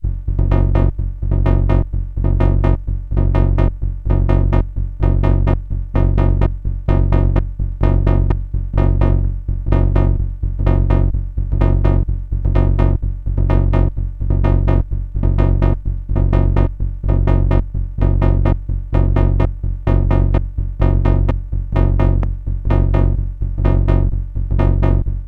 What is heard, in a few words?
Sonic Six, synthesizer